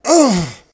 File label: Scream